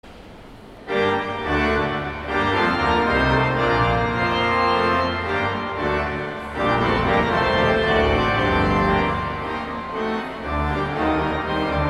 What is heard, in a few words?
Organ, Postlude in g minor; St. John's Cathedral, Jacksonville Florida; Charles Villiers Stanford